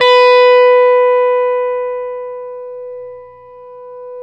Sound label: STRATOCASTR